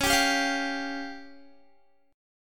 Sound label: Db Major Flat 5th Db down Guitar; strummed